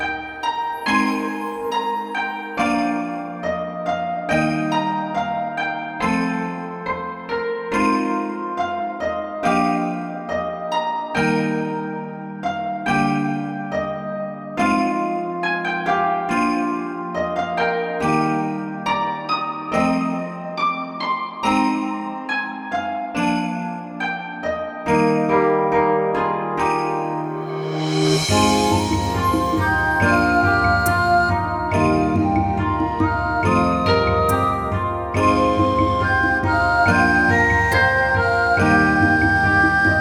BGM

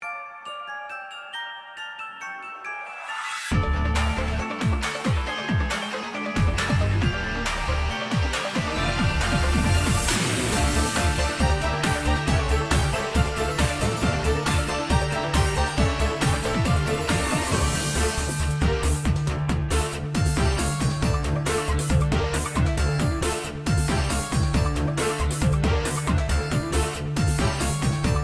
off vocal

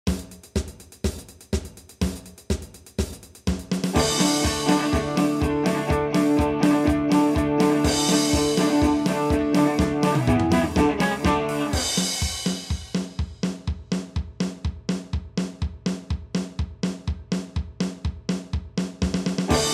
guitar

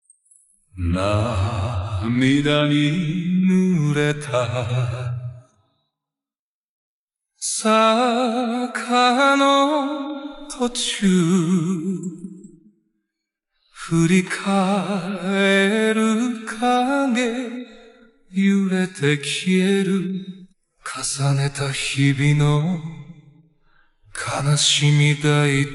Vocal Isolation

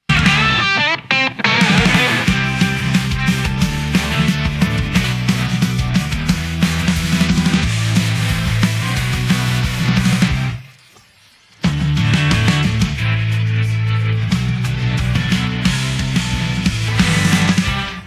karaoke

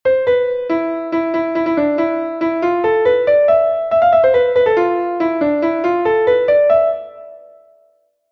melody

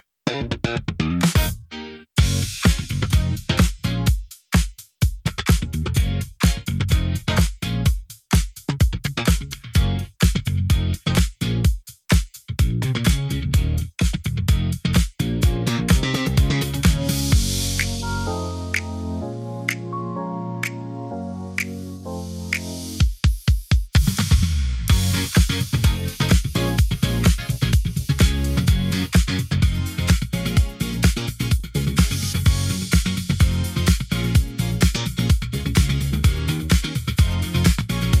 (Instrumental)

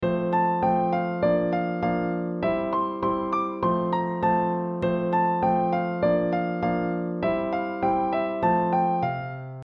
piano

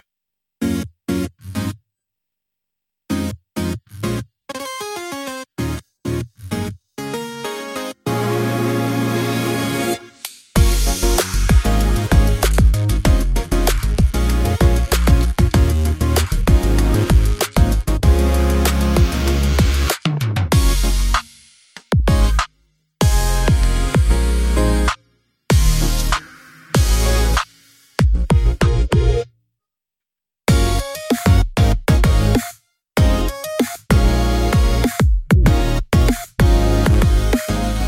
Instrumental

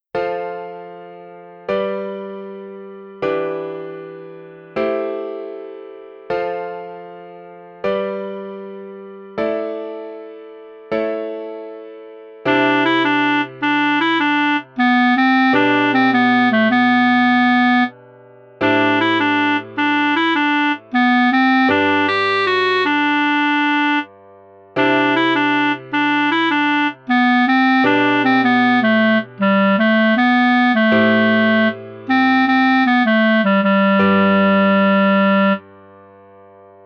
BPM78

